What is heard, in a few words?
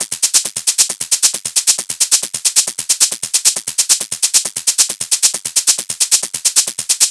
Hat Loop 135, Techno; Ghosthack; Techno Sounds 2025